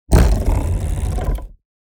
Vintage friction mechanical texture

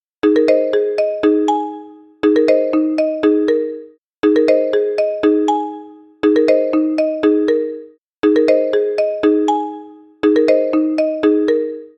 Smartphone ringing modern ringtone loop

